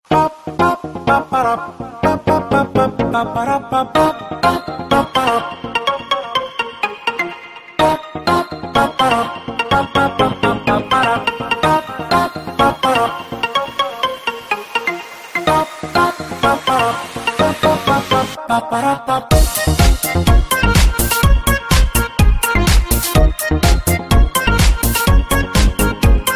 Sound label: Drummers